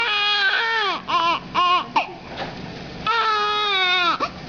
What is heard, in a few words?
cry